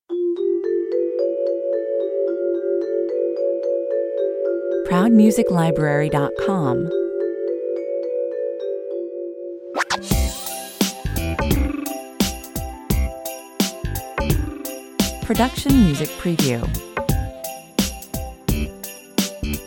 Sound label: jingle bells kids 86 bpm